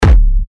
TECHKICKS C#, techno kicks; MONSTA; revealed techno kicks vol 10

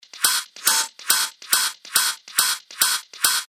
140 BPM, techno loops; Rendered; revealed techno top loops vol 8

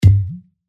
F, percussion; Meander; revealed percussion vol 3

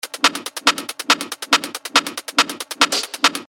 140 BPM, techno loops; Always; Revealed Techno Top Loops Vol. 9

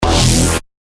E, bass shots; Vertical; revealed bass shots vol 3

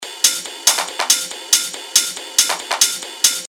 140 BPM, techno loops; Alora; revealed techno top loops vol 8

